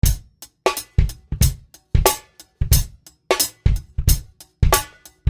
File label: Beat